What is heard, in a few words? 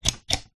household cupboard door latch antique movement open; zapsplat